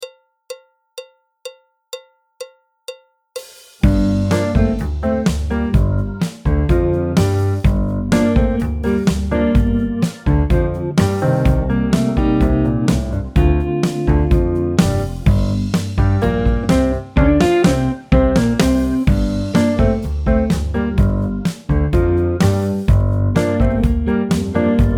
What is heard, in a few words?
alto, trombone, piano; Jazz Syllabus Level 4